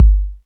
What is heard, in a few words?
bass drum one shot g key, Royality free kick drum tuned to the G note. Loudest frequency: 78Hz